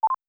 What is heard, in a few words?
beep short on